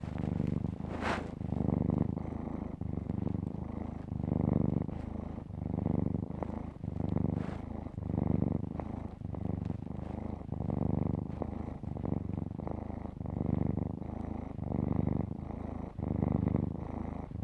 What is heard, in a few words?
purring